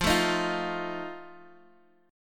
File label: D sharp Suspended 2nd Flat 5th F